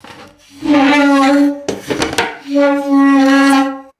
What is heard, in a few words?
wooden chair dragging on tile floor